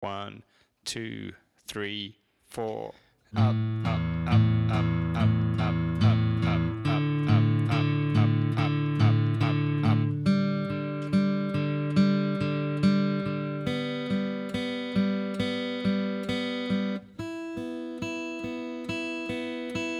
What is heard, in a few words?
picking double up, guitar